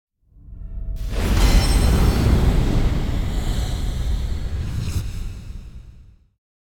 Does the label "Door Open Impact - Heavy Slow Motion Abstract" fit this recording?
Yes